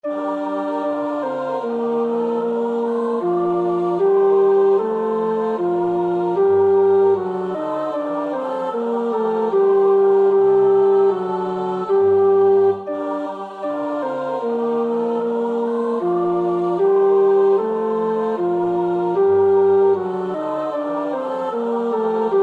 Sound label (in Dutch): tenor, koorpartij oefening; Bach (Johann Sebastiaan); BWV 114